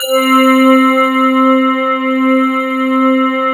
C5, SYNTH; ASTOR; Q Up Arts; Dream Experience

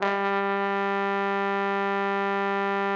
TROMBONE; Best Service

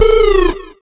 noise 2, sound noise; NOISE+ALARM

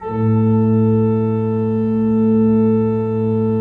A1, Cathedral Organ

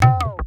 tape fx, scratch; Ambient Grooves

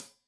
Hat Tight, Hi-Hat, Cymbals; Deeply Sampled Drums; Stereo

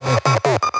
8Bit Fx; Antidote Advent

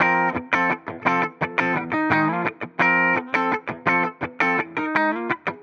Tele, Guitar, 85bpm, F, dusty funk; musicradar

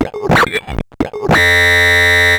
Bent Talking Teacher, Circuit Bent

